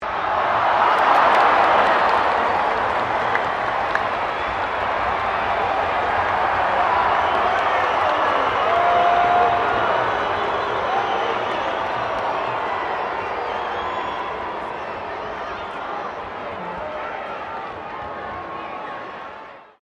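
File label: crowd 15K Rugby World Cup cheer medium long, Rugby world cup game; Crowd Sound Effects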